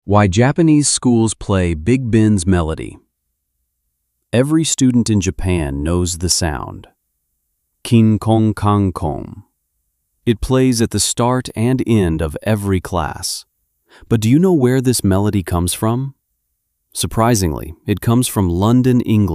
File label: kinkon, Big Ben's Melody; why japanese schools play big bens melody